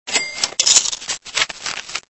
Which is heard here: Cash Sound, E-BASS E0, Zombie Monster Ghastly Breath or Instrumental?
Cash Sound